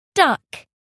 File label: duck